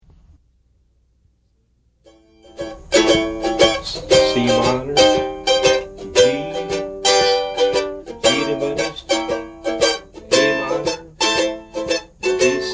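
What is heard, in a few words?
Chords, mandolin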